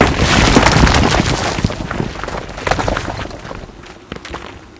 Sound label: break stone